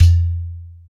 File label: PER Percussion